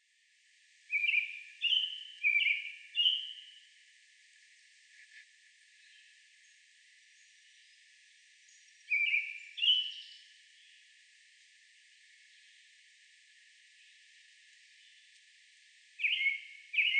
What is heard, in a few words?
American Robin